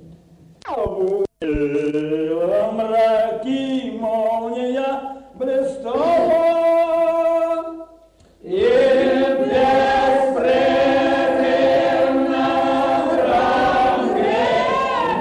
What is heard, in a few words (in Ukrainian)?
Рос пісня